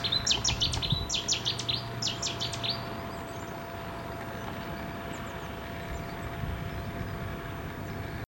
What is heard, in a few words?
Cetti